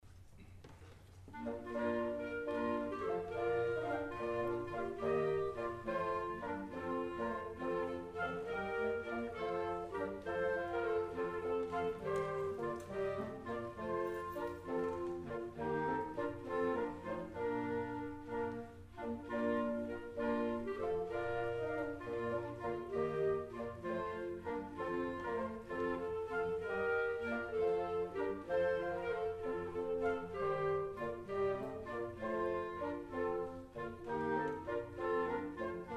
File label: Courante, Chamber Winds and Percussion; Michael Praetorius